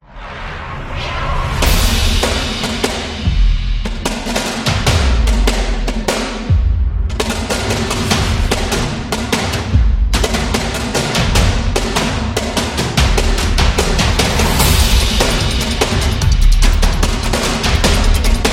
Football Epic; action sport

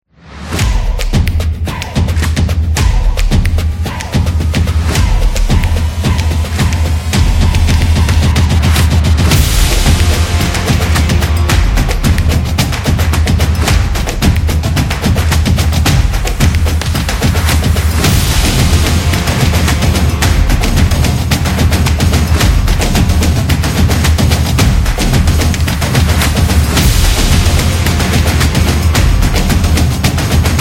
Stadium Drums

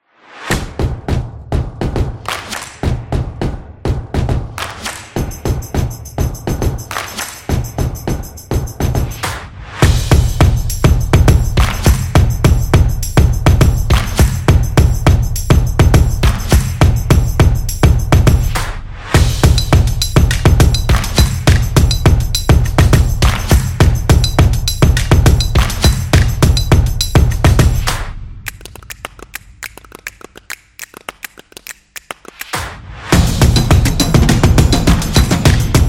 Drum Sport; snaps and claps

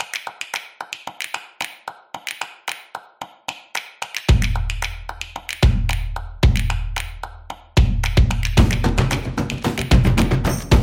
Drums Action; snaps and claps

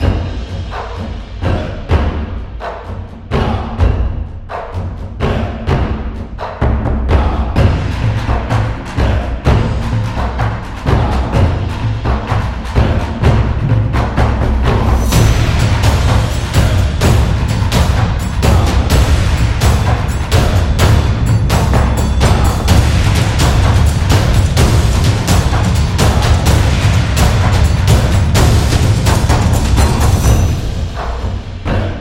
Taiko Drums Japan

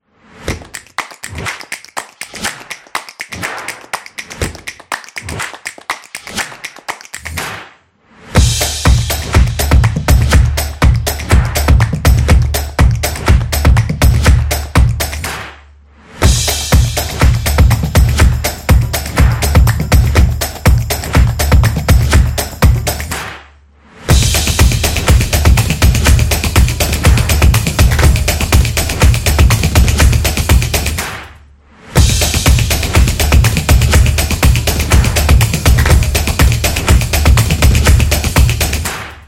Drumming Clapping

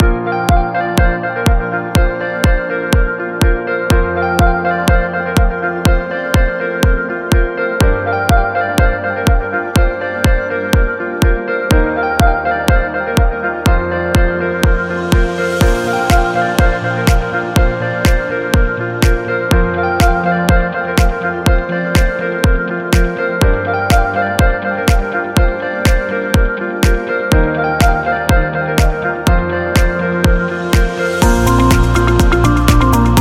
Commercial Happy

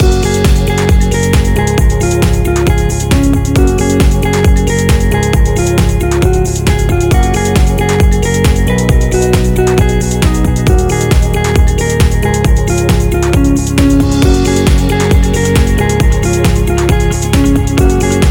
Corporate Loop Positive

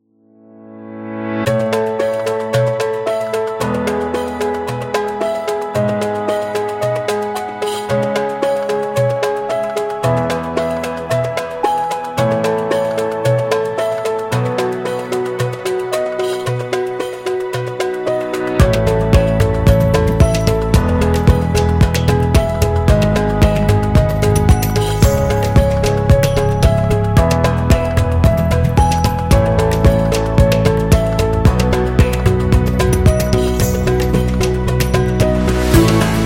Hindu

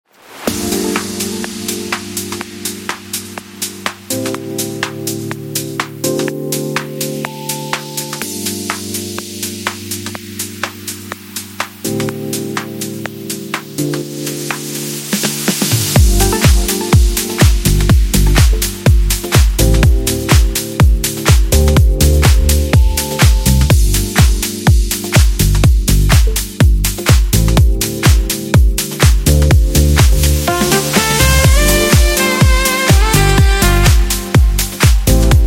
Luxury Beat

